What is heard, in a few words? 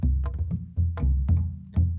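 Calm Far, music; From Dust; ps3; xbox 360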